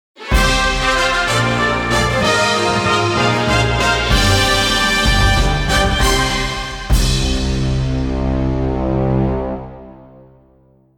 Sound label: Double Up Win